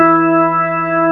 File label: B3 E 3, organ; AKAI S-Series CD-ROM Sound Library VOL-1